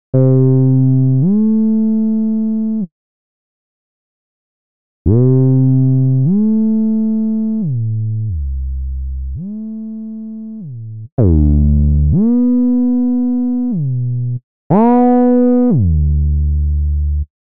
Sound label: Octaver Bass Portamento